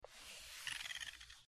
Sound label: Short Squeaky Mouse